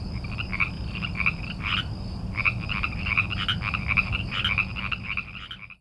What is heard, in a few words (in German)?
froesche; tiere